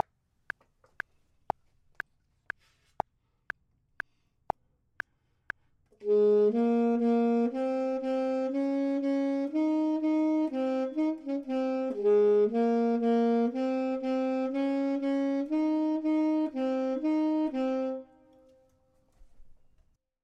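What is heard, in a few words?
bottom, Saxophone and Clarinet; voxman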